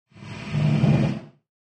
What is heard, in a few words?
Space Door Close Sli, doors high tech